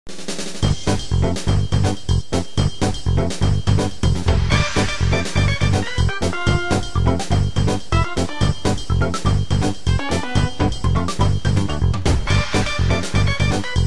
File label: results; Smash64